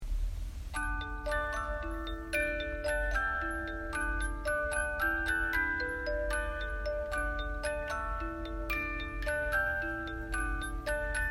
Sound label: 36 lames